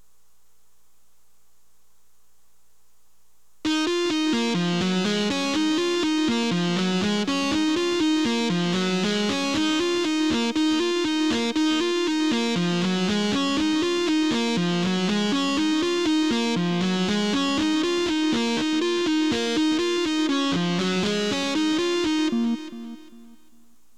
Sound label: Delay Guitar Recording